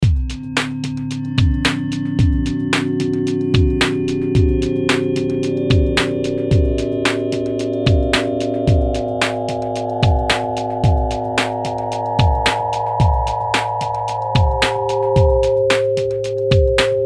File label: The Unity Tone Stereo